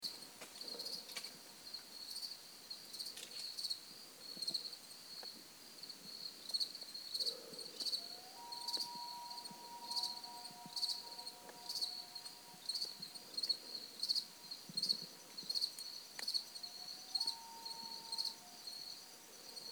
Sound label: Crickets and loon early morning at South Lake Desor; Isle Royale; a restless night at South Lake Desor